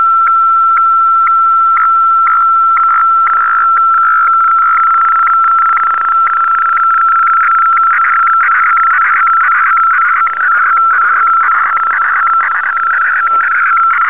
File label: fax